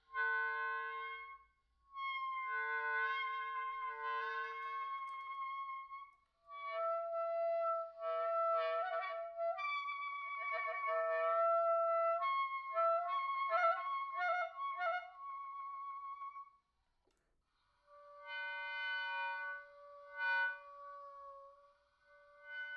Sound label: Multiphonics, Oboe